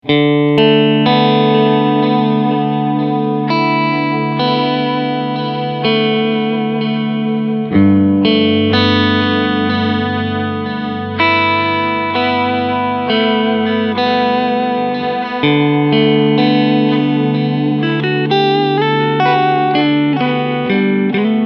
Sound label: Cali Sansamp Clean Delay